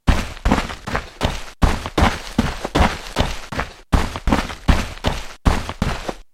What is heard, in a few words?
move; Disruptor; Dota 2